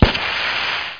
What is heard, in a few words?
PIP STEAM